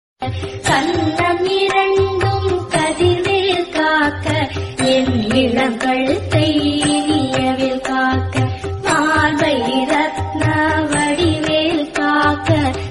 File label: MURUGAN SONGS RINGTONE TAMIL